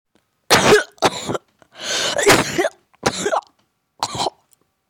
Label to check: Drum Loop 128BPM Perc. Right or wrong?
Wrong